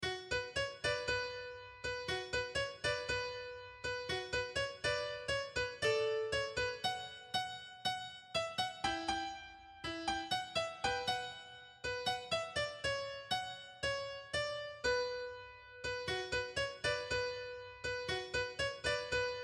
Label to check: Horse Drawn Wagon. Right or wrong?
Wrong